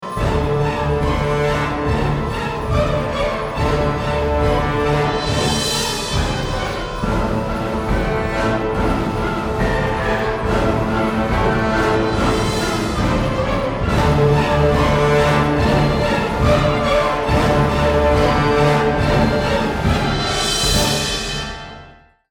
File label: Death March